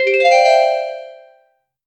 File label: a short cheerful retro ga, chiptune